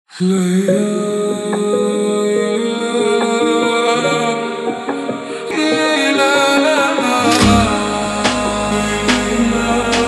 super Slowed, funk

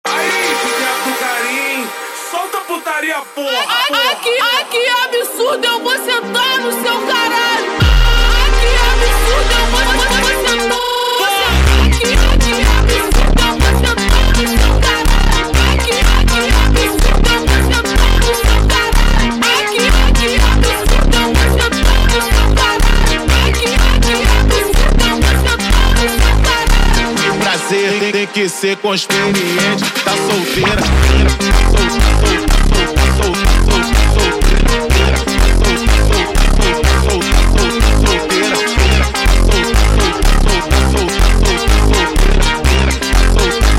slowed, funk

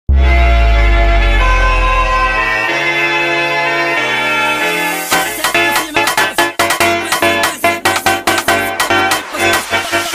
Funk Woodwind sped Up

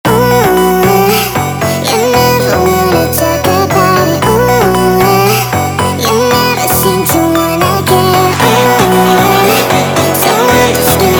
Funk, funk

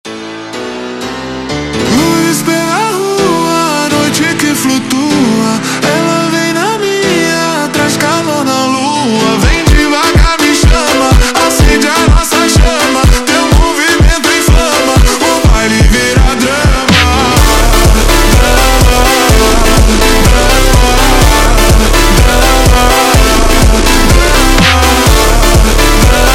Slowed, funk

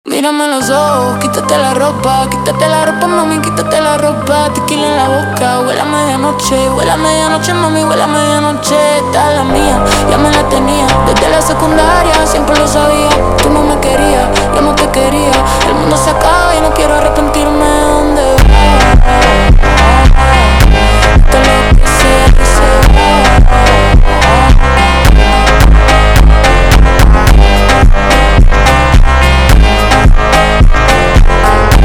slowed, funk

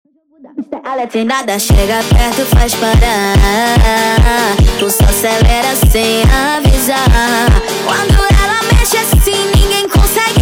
Montagem sped Up; فانک

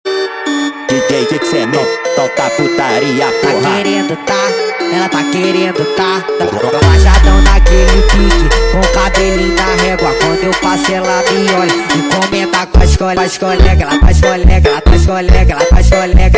Funk sped Up

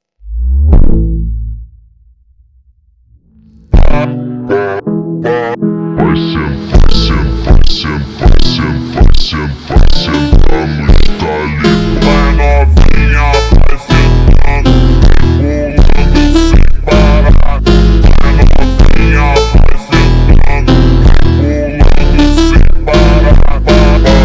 Montagem Mega Slowed

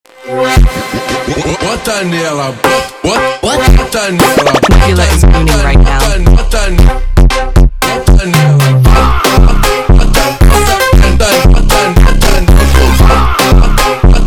slowed, funk

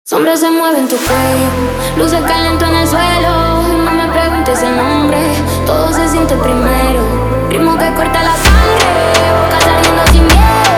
Montagem, phonk music

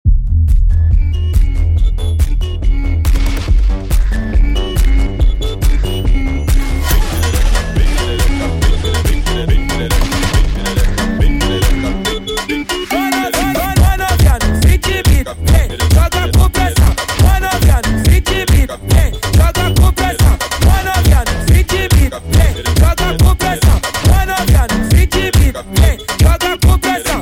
Funk sped Up

